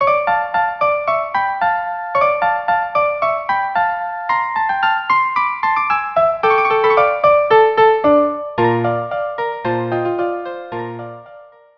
quartet; Choeur des Gamins carmen

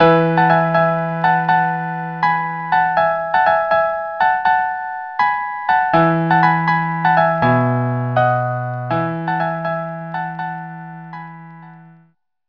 quartet

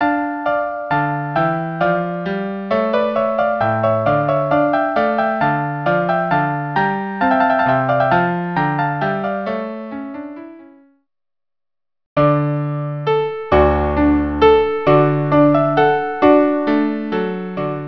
trio

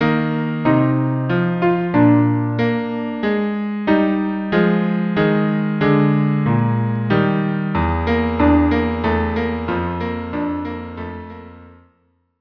cello duo

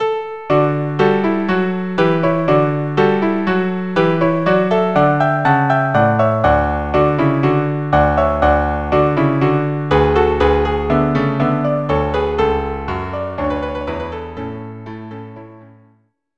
trio; bach marche

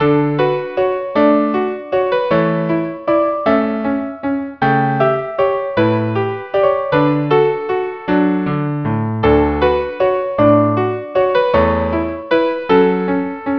string trio; La Rosa

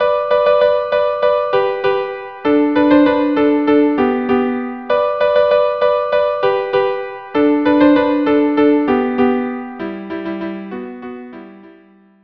viola duo; Rossini; 5 duos

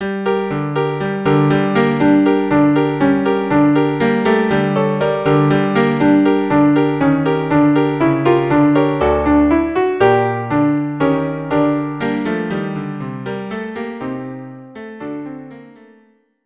cello; Khosn Kale

